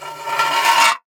Metal Slide

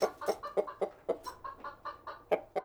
Chickens in Barn; Animals; 1001 Sound Effects

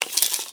Tape Measure Open; Tools

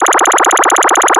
Arcade Movement; Video Game Sounds; 1001 Sound Effects

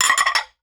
Bowl Remove from Cupboard, Kitchen

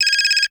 Cell Phone Ring; Communications

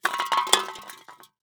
Soda Can Roll

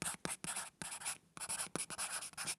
Write on Cardboard with Pencil; Office Supplies

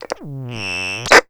Walkie Talkie Interference; Communications

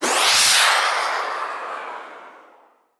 missile; 1001 Sound Effects

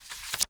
Book Page Turn; Office Supplies

